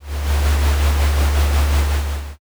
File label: escalator